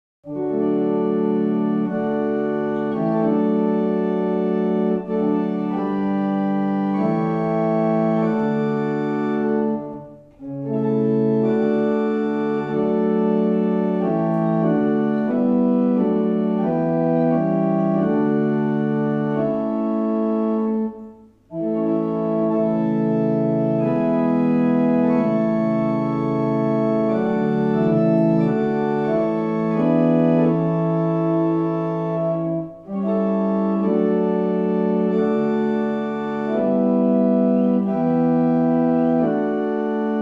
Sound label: barrel organ; Llanfair